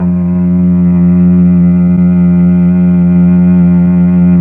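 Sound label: Mellotron Cello F3, Slow Cello; Keyboards of The 60's and 70's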